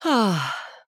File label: Sigh; Zarya; overwatch